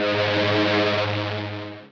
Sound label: string, Guitar; Notes